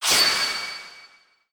phon pi

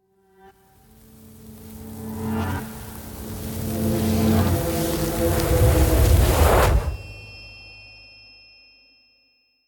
Shrine Egg Interaction; The Inn 2D; Devour 2021 gamerip pc macos

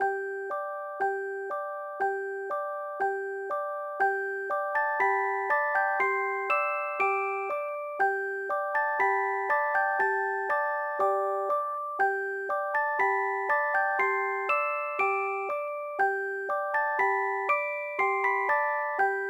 M Sleep; nds

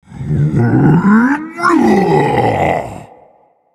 Vocal Colossus Efforts Heavy

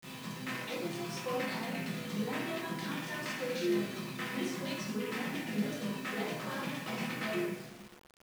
bgm karaoke; usen; yakuza ryu ga gotoku kiwami 2 pc gamerip

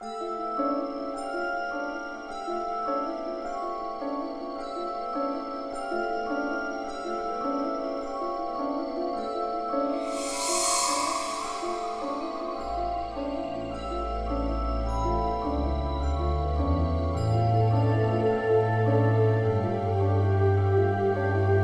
Level Sting

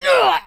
pain; jarlaxle; Idle Champions of the Forgotten Realms

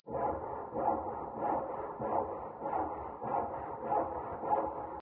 Amb Pigcity Sawingwood

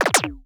new death

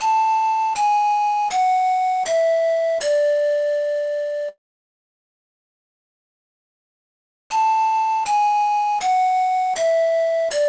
Spirit Flute Practice; the legend of zelda spirit tracks

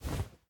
Cloth Jump

